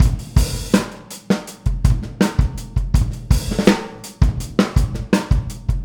Boogaloo, Full Mix, 82 BPM; Drums; Verse; Sonic Foundry; Drums from the Big Room The Mixes

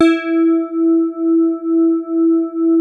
HARD E3, synth pluck; Music Store - Claudius Diemeter Schwerlos

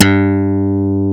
FUNK HARD G2, DIGITAL BASS; Music Store - Claudius Diemeter Schwerlos

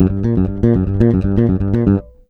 RUMBLE, Bass, 112 BPM; Sampleheads

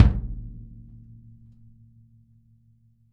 kick, Drum Kits; Sonic Reality; Interactive Drum Kits; 90s sample cds

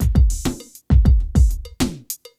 drum loop 100; Vinyl; Masterbits; Vinyl Attack

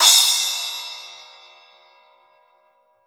Raw Crash, Drums Acoustic; USB Soundscan vol.10; AKAI; 90s sample CD

